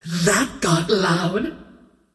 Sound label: scream good; queenofpain